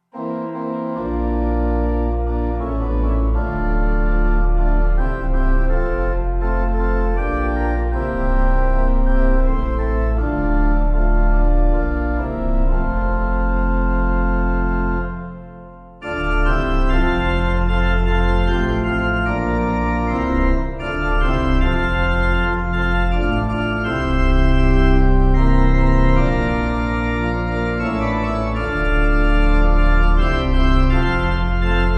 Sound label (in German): Orgel; Meine Hoffnung und meine Freude